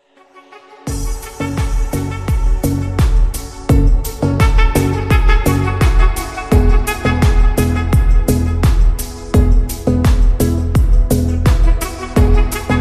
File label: Deep Breath Slowed Reverb Ringtone